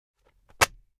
Newspaper Drop; This Is the Police

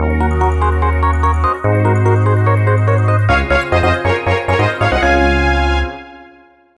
(Jingle) Clear

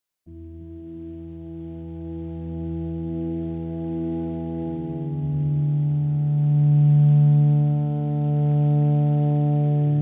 Organ; Unalive; Wii U; Windows; Xbox One

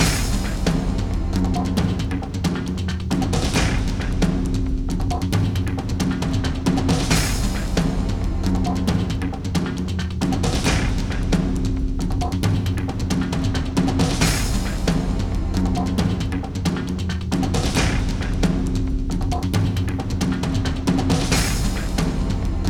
Combat Crystal Cavern

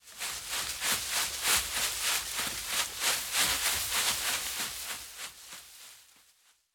Footsteps Undergrowth Run Medium; the 39 steps

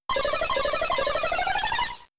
Skill Swap; Pokemon; Gen 3; attack moves; RSE

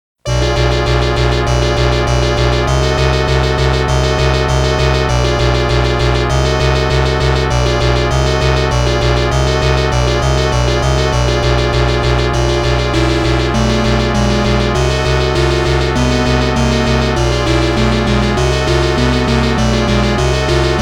Boss BGM; Gun Smoke; Capcom Music Collection Vol.1

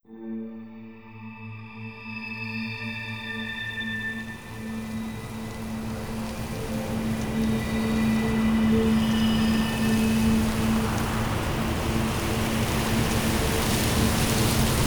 Swamp Ambience; monster hunter complete soundtrack

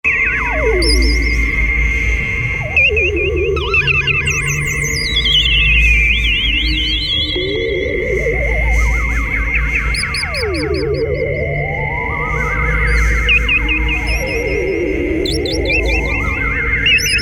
Slingshot Horror Core; The Lab; valve

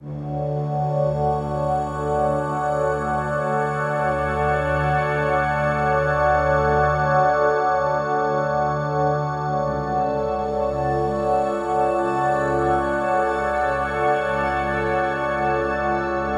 Lobby

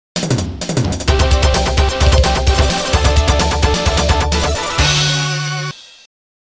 Score Board; soccer brawl neo geo snk 1992

